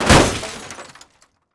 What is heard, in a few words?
break